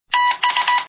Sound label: morse code